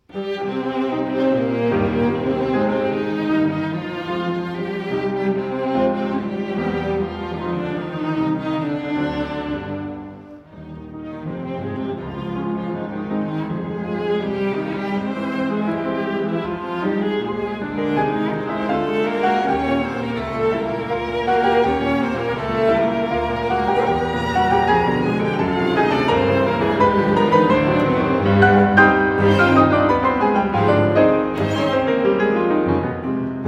piano; Fauré - Piano quartet in C minor; Festival Montalcino 2012

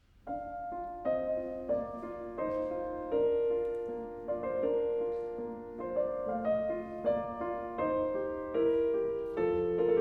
wind mov2

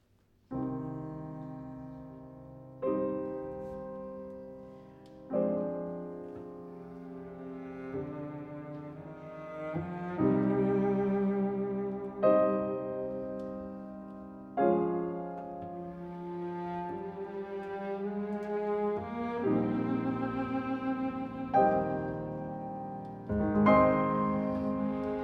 piano; Fauré - Piano quartet in C minor; Festival Montalcino 2012

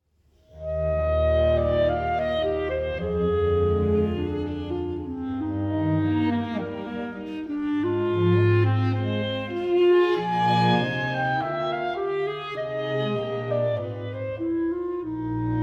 Clarinet Sonata 1st mov

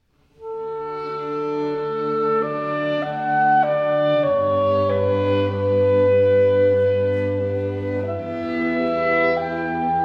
clarinet 2nd mov; Mozart

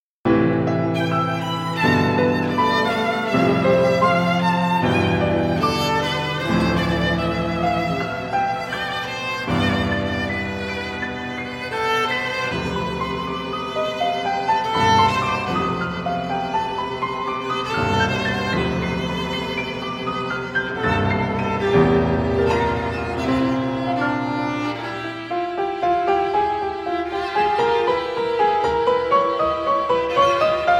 piano 2nd mov; Shostakovich

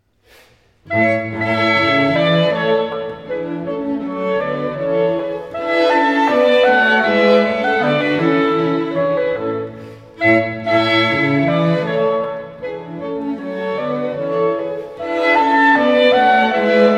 clarinet 3rd mov; Mozart

